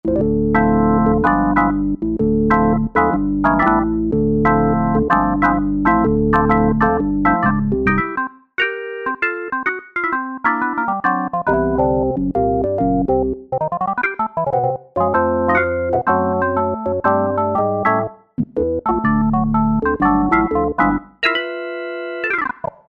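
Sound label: CX-3 with Perc; Sonic Reality Modern Songwriter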